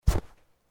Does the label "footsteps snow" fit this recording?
Yes